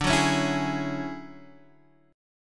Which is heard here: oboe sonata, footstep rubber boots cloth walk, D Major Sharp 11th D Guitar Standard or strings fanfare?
D Major Sharp 11th D Guitar Standard